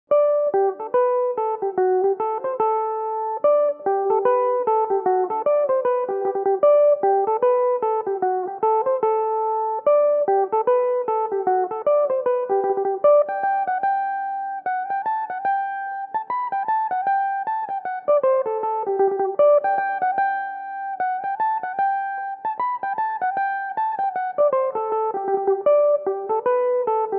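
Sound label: reel, G major, mandolin; Gan Ainm 25; Belmuse EM-190 electric mandolin; Reel (4/4)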